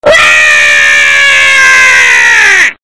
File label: uwaaa